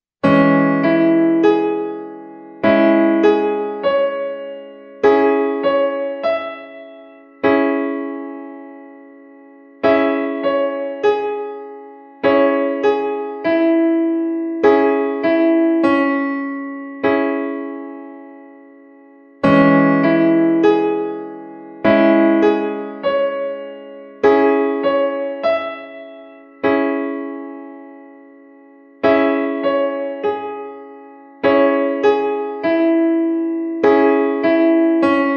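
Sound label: C sharp m Dbm Piano Keyboard Chord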